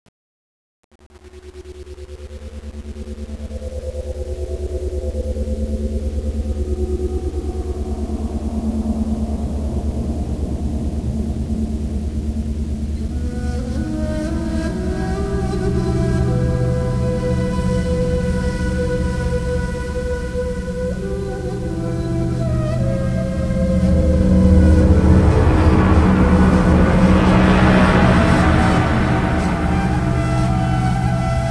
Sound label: aftercrash; dusty2